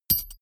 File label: bullet drop metal